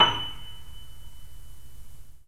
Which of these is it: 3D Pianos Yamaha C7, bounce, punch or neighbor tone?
3D Pianos Yamaha C7